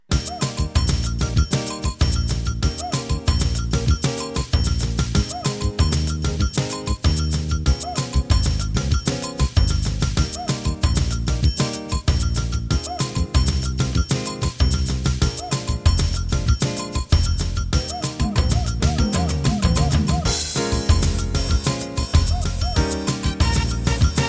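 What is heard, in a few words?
Samba De Sol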